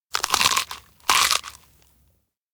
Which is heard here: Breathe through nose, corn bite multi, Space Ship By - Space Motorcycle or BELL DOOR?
corn bite multi